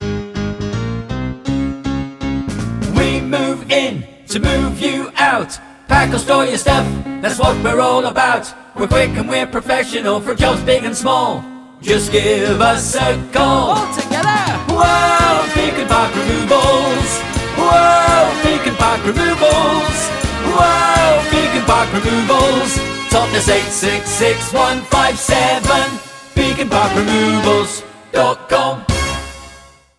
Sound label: heart radio jingle